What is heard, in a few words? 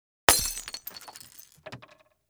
Bottle Alone